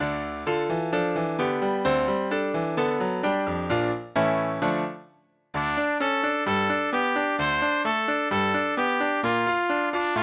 Tota Heľpa, pre tr. a klav.

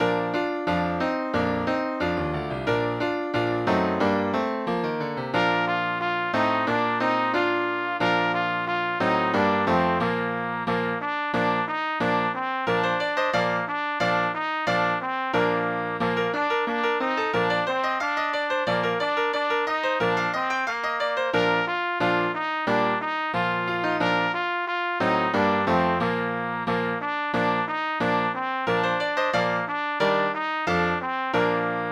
pre trúbku a klavír

